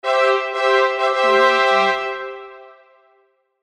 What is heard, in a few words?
winning fanfare